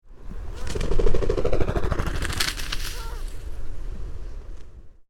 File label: Rhinoceros Auklet landing, Protection Island